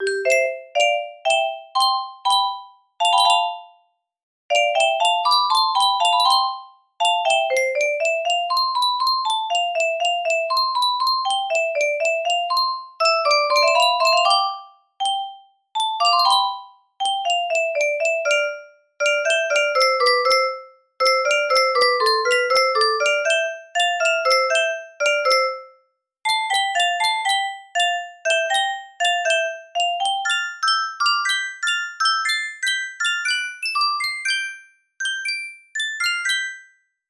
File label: Bicycle Ride